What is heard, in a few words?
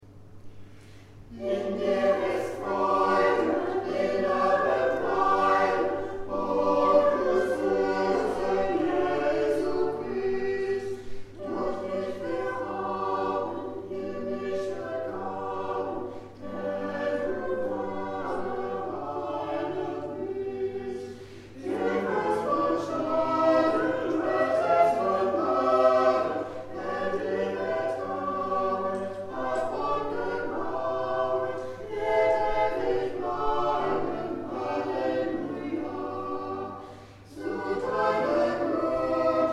In dir ist Freude Chor; Orgelbuchlein